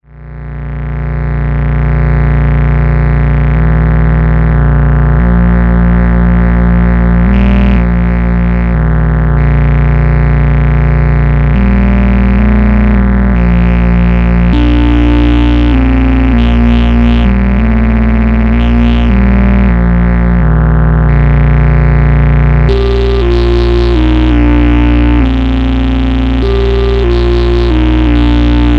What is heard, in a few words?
waldorf pulse